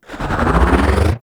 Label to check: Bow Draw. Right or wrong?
Right